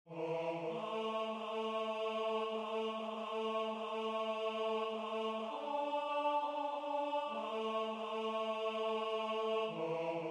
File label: Tenor